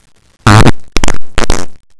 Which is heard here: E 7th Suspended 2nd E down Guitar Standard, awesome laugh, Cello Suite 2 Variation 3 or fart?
fart